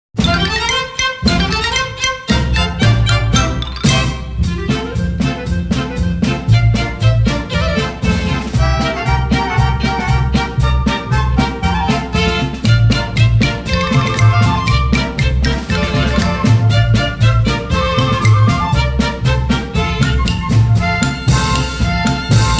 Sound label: polka, instrumental; zigeunerpolka